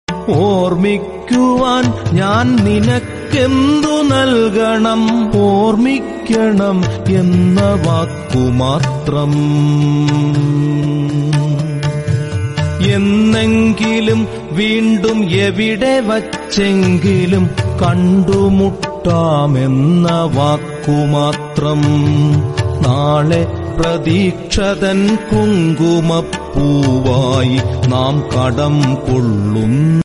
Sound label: malayalam song